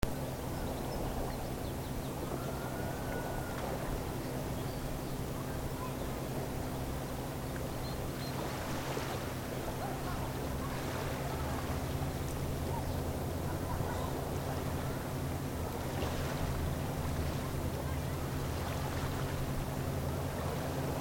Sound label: small beach; a little bit dirty and waste; small border crossing called "lazaret"; between slovenia and italy; few meters from a small border crossing called "lazaret" (sic!) we take a walk on the site between slovenia and italy.; bordersounds